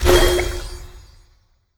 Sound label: Herbalist Spell